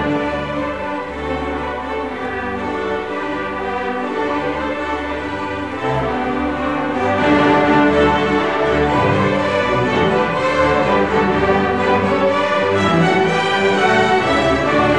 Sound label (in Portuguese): Musica